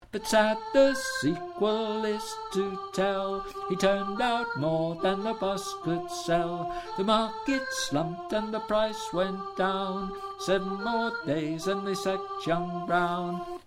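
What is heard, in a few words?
Soprano Voice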